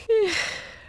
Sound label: breath; Wired for Sound Pro CD; Aristosoft, Inc.